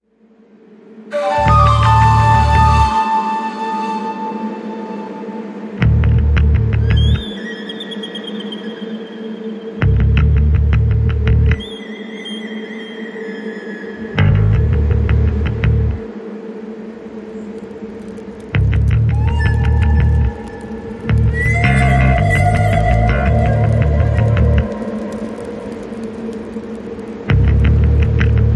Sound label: Campfire